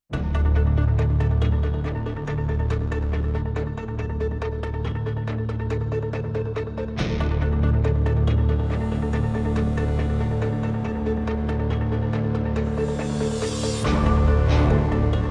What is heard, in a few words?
No Perc, No Choir; Brand X Music